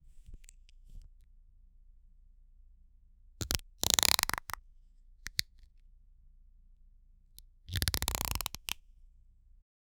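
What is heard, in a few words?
tightening grip on a plastic, toy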